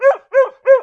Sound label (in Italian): Cane